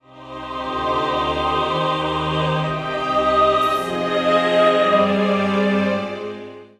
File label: Agnus Dei Chorus